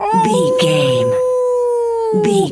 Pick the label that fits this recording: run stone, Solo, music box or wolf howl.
wolf howl